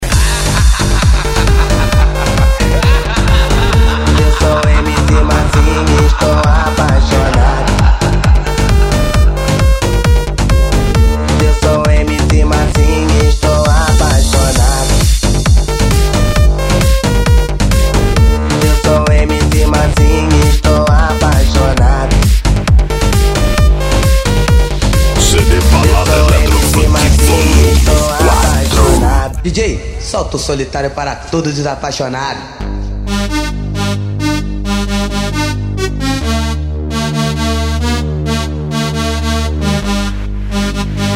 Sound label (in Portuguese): eletro funk; cd balada eletro funk vol4